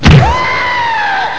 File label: BIG HIT; carmdemo